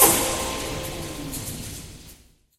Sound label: Lift Loop End